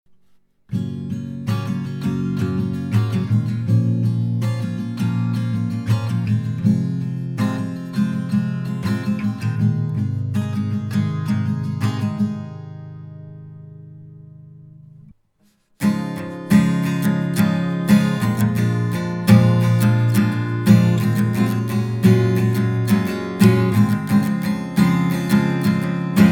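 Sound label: Arturia MiniFuse 2 acoustic guitar; Акусстическая гитара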